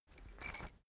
key ring small trousers pocket put

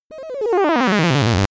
synthetic energy drop

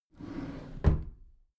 packed drawer smooth close soft halfway

